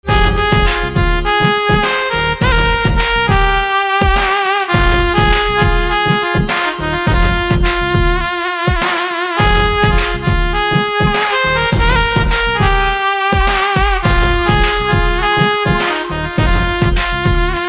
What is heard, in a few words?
Saxophone Instrumental Ringtone